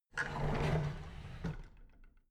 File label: drawer big clatter close regular